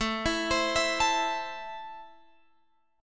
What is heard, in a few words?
A Minor, Guitar, Open F; x,16,16,19,19,16; arpeggiated